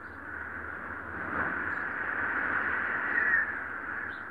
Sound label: Wind Whistle Loop; weather fx; Silent Hill Downpour